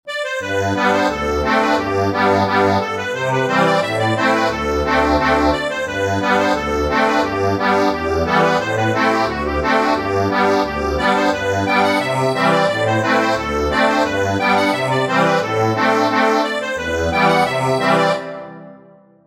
accordion, sheet music

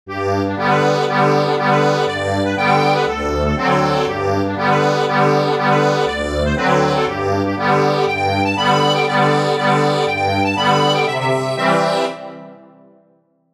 Strathspey ACCORDION

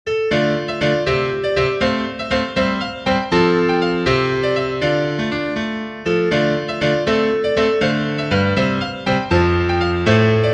The Black Nag for piano

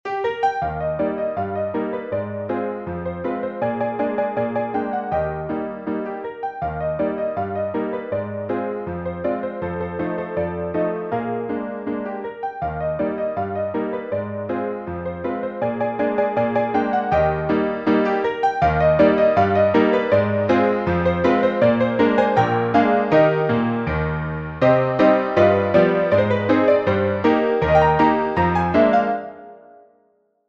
PIANO

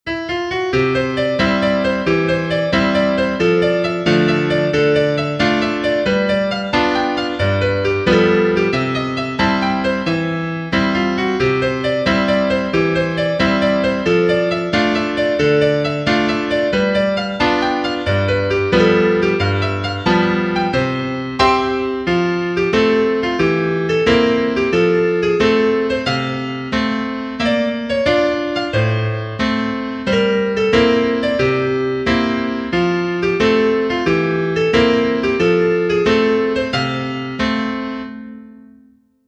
piano

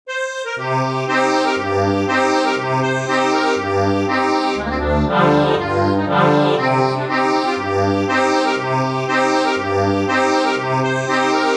accordion

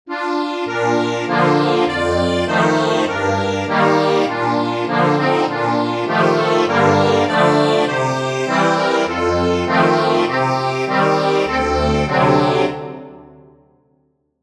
ACCORDION; We sing the praise of him who died

